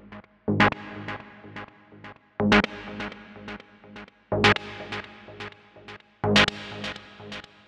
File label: 125bpm Synth G-